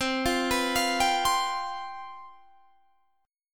C Major 7th Suspended 4th, C, down, Guitar, Standard; arpeggiated; CM7sus4